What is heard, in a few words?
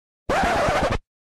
DJ Scratch